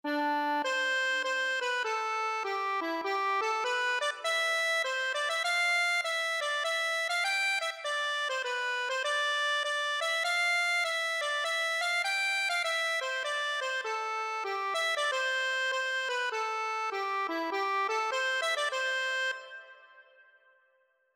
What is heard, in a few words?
accordion